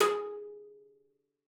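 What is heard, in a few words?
CELLO spiccato G#4; 90 s Sample CDs; Xsample VOL-5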